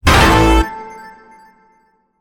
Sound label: player level up